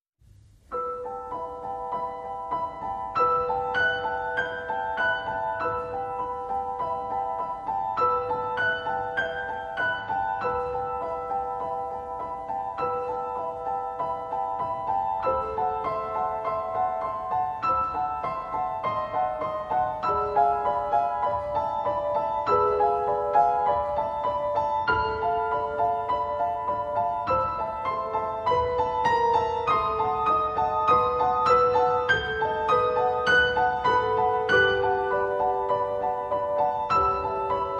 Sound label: Only Piano